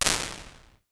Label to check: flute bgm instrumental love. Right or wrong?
Wrong